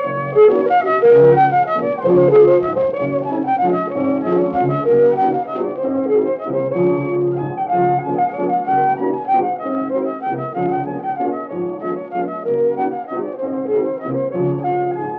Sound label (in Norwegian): MUSIKK; amerikanorsk; einar haugen